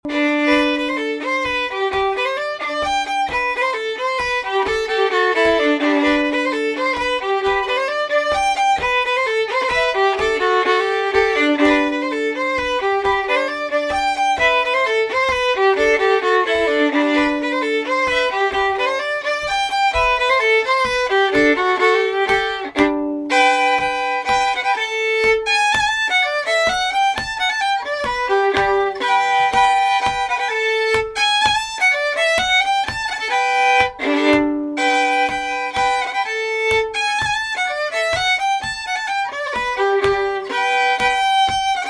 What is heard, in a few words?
polska (fast, slow); Kroarns; Bohuslan